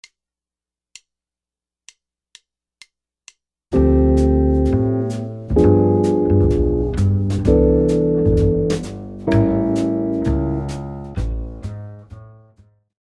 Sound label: jazz guitar comping